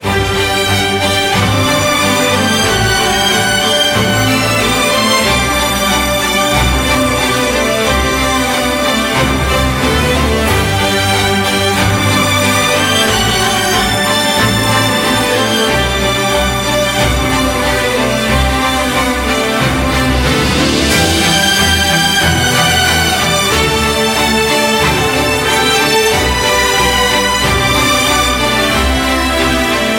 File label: Samsung Galaxy A10s